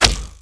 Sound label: arrow hit; megaglest